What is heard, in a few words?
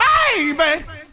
"baby" SUNG; Voice; ooh ahh